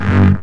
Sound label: Bass G, Offbeat Bass; Vengeance - Essential Clubsounds Vol.2